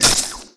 Web Stream Shot, Weapons

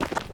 dirt; firefight

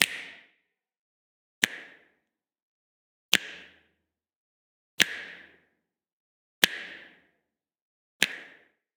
snaps; human; NS Studios - ultra sound collection

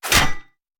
Bullet Impact; Sound Ideas; Guns

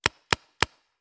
Paintball Gun; Sound Ideas; Guns

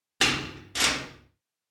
prison; Inquisitor 3

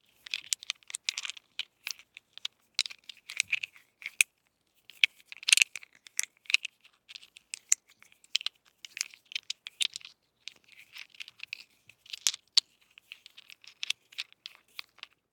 DICE in Hands, Manipulate, Four Dice; Samples; ArticulatedSounds; DICE Sfx Party (Extended)

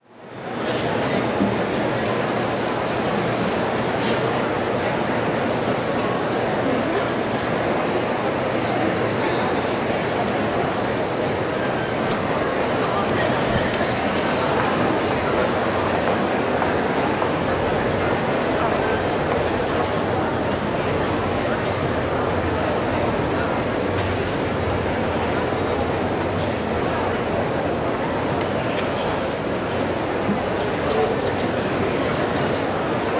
Crowd Indoor Medium Crowd Large Hall; Walla; Sound Ideas; Walla Series

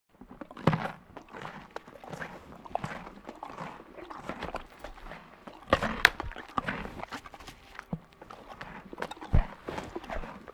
Animal effects snorts grunts farm ambience

